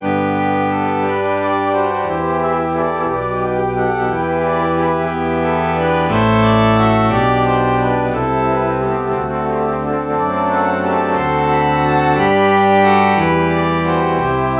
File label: reed organ alto flute bach morph